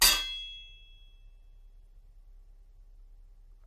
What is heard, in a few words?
metal hit